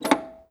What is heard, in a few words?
payphone in